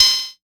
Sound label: hat, Korg MS-20 Drums; Polyend; Simulacrum Sounds; 1 Shots; Synths